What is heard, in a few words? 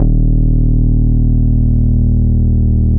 EB1, MATRIX 12, Vintage Keyboards; Big Fish Audio - First Call Vintage Keyboards